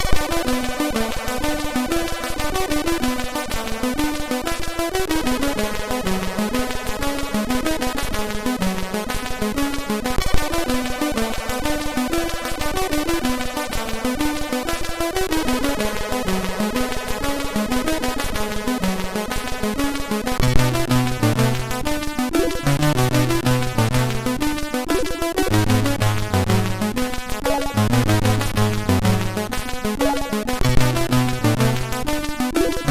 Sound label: tune sid MOS6581R3; Arachnophobia 07 tune 5